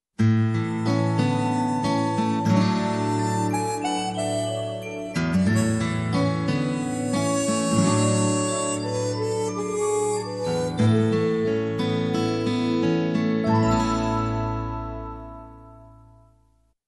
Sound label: Country Morning, bluegrass music